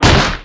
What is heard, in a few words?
punch